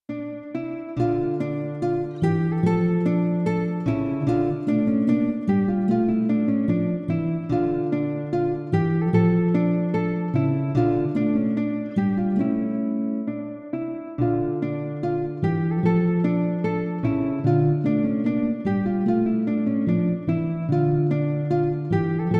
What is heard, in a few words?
bourree, Bourree